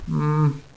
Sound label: nervous, hostage reactions